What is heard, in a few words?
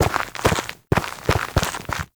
Forest Night Steps B; Sacrifice; Ballas; Cinematics